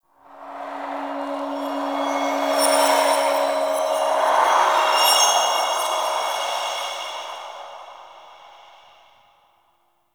Interior Cave Spirit Gust; Warframe; Duviri; Ambience

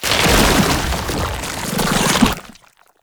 Tank Infested Charge Rise; Warframe; Lasrian Tank; Abilities

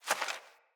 Fawn Footstep; Foley

